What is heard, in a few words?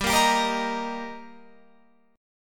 G Minor Sharp 5th G Guitar Open F, chord; strummed; JGuitar